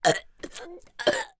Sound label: human burps two children 5 and 7 forcing burps